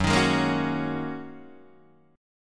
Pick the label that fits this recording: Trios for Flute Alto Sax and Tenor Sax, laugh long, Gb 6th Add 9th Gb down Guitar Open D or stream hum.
Gb 6th Add 9th Gb down Guitar Open D